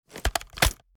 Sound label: inspect end; EMXArms; umx32